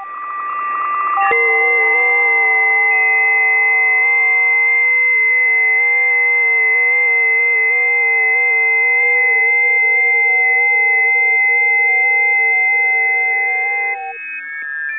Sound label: 20m busy; ft8